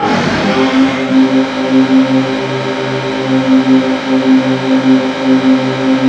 ELECTRIC

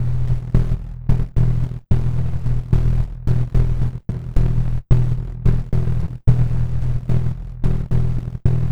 Bassline; Drums & Drones

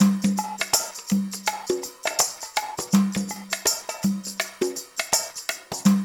loop, percussion; InVision Interactive; Percussion Slam; PRIMADONNA

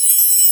Chimes

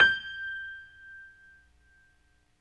PNO XYPNO, Grand Pno, Roland

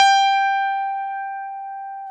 PNO CP70; Club-50 - Foundations Roland; E. Grands

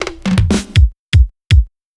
Fill, Drums, Eclectic Electronica

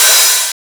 SUPER O HAT, PHUZZ DRUMS; Spectrasonic Distorted Reality 1

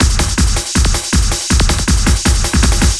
LOOP, Eurotech; Sounds Good

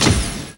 SINGLE SLA, HYDRAULICS; Northstar; The Wizard VOL-1

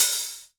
hi hat open, Korg Electribe ER-1; Kit 1

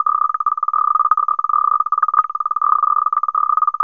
CLICKER; Zero-G Ambient 1

